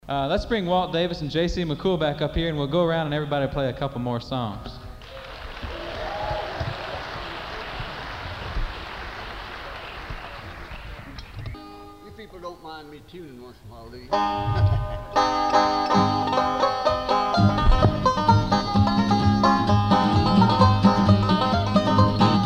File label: blues banjo; crooked creek